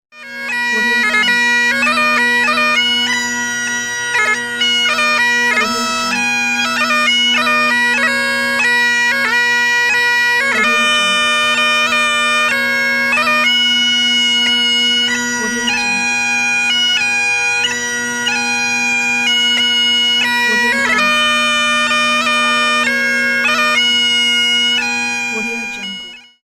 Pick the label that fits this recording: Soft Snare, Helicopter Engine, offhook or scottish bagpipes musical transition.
scottish bagpipes musical transition